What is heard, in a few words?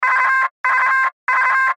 Radio Morse Code; Morse Code Weak Signal Call Up Vvv; Morse Code Sound Effects; Military; Blastwave; Warfare